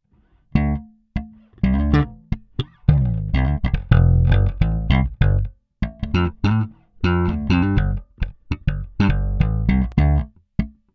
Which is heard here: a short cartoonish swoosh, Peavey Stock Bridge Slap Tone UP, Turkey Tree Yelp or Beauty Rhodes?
Peavey Stock Bridge Slap Tone UP